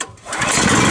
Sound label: generator start sucess